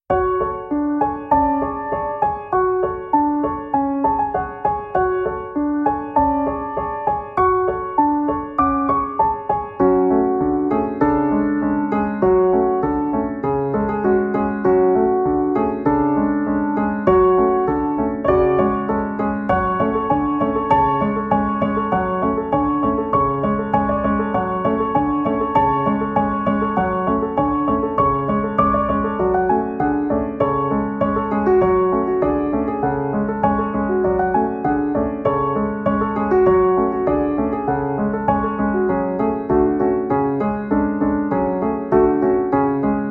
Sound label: Classical